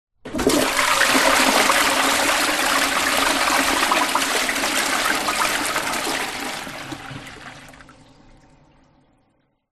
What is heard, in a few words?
household toilet flush